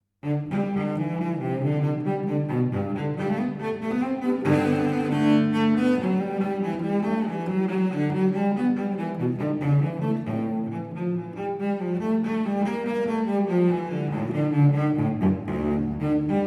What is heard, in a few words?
cello suite G gigue, baroque; bach; cellosuite1